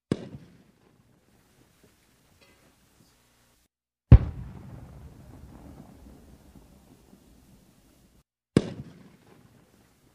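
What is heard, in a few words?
machine gun 50 cal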